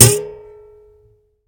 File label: string Snap; SnootGame